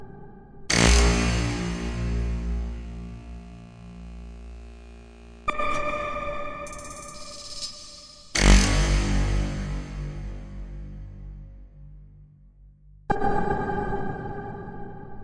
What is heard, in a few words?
126 blart bass traphats space thingies; SYNTH AND ELECTRONIC LOOPS